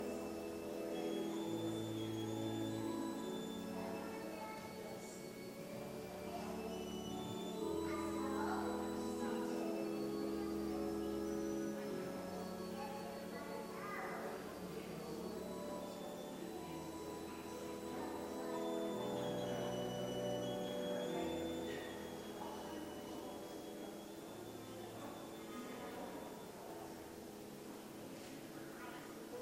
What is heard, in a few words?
Cathedral Organ